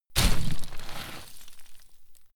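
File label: step gravel step, footsteps; schritte; menschen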